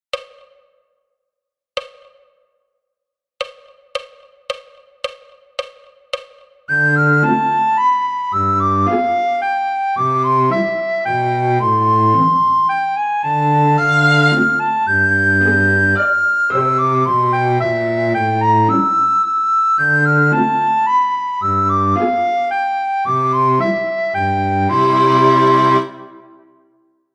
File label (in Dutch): Nokia Tune sopraan; Flierefluiter 5